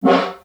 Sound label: SN Far, Snare; Ableton Foundation FreePacks; Snare Designer